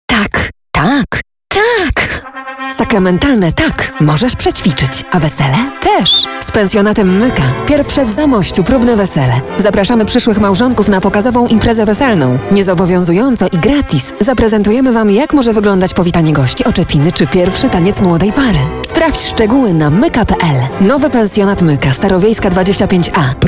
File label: pop